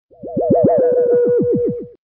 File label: Scifi Transform